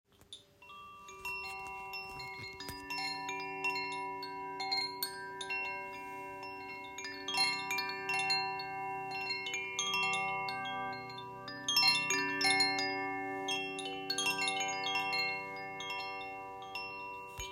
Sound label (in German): Feuer